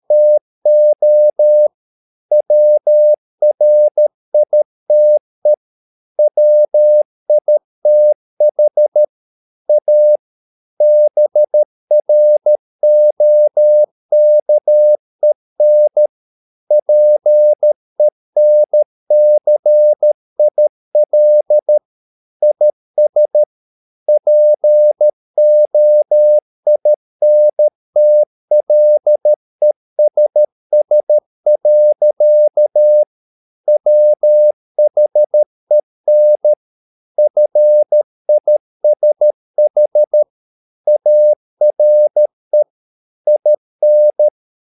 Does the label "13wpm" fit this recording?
Yes